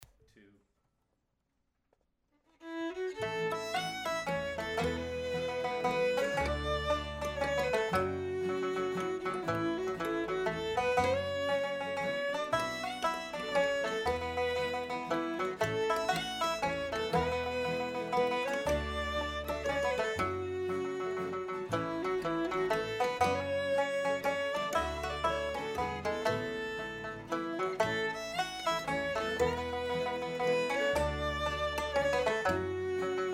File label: Waltz